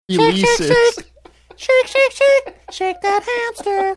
singing funny high pitched